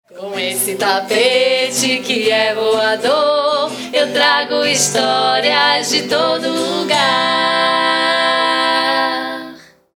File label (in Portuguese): VINHETA; O TAPETE VOADOR